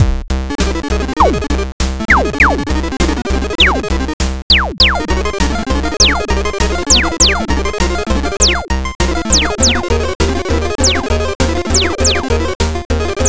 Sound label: retro beat